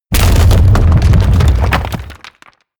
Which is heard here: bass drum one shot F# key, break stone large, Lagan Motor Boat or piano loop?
break stone large